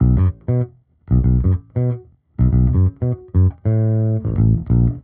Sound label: bass, Loops Phonky